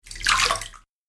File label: Water Sound Brief Motion; Water Sound Effects; realistic audio sfx